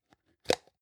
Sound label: plastic can small lid open soft